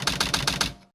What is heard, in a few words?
multiple people are typing; Snapcube Sonic Adventure